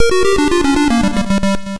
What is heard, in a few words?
death; Arkanoid1